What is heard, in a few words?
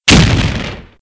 God Head Blast; Playable Tutorial Level